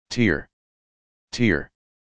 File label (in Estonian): heli